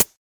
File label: shiny hihat sample D key; hat samples